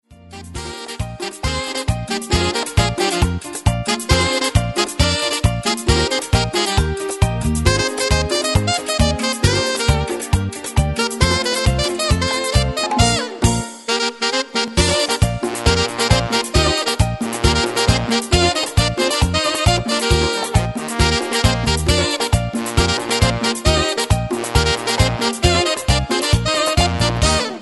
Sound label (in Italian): Sax latino